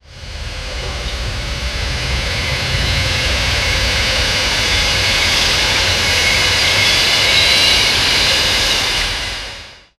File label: old steam train engine